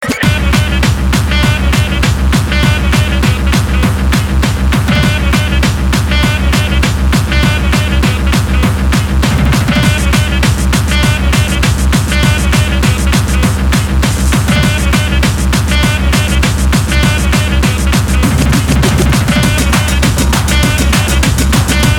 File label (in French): oh le sax!